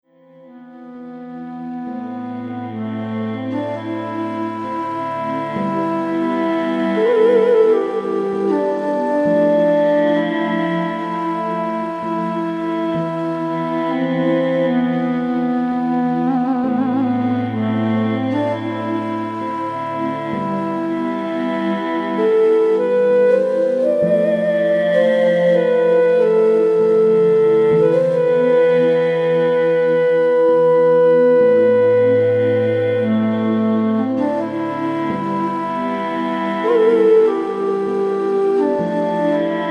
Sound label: Bansuri Mood; Qigong-Music